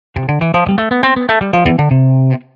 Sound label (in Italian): Esercizio, guitar